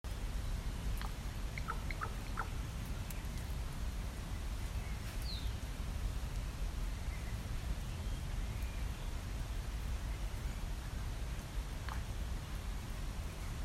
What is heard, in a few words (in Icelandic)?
Umhverfishljod